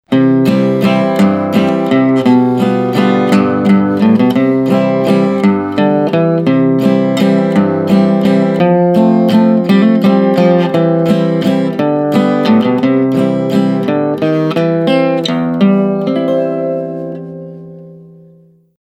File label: Accoustic Guitar